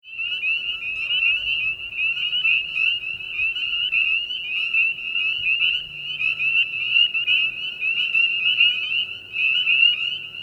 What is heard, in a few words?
Spring Peeper